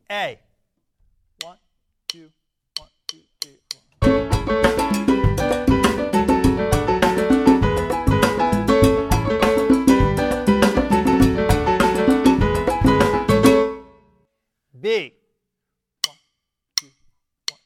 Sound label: Timba Rhythms